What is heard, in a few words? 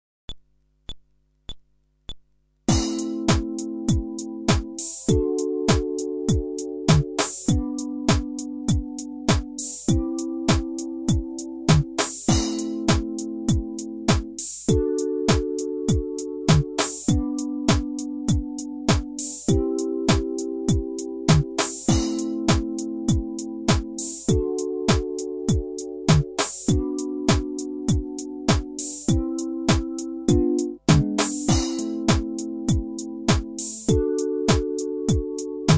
Groove ベース無し